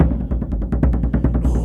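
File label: Music Shield; From Dust; PS3; Xbox 360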